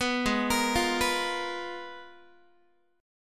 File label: G sharp Minor 6th Add 9th G sharp Guitar, chord; G#m6add9 arpeggiated; 14,16,13,15,15,x